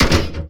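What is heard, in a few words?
ladder metal, player; quakemapping; mod xj18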